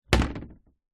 household plastic table set down or knock over wood balcony; zapsplat